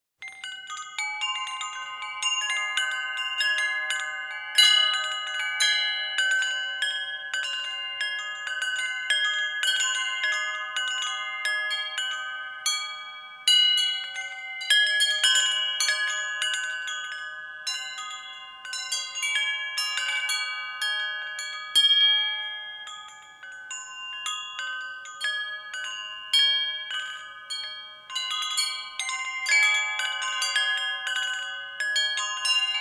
Wind Chimes Brass; Electa Quinney

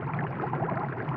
Water, Bubbles, Cauldron, High; Poetry Project

